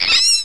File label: rat die; XDM